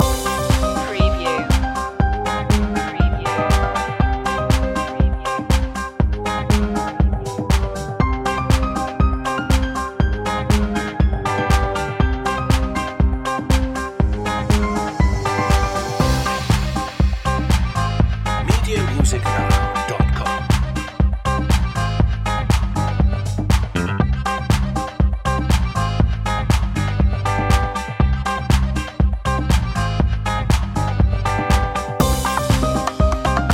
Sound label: latin dancing loop long; media music now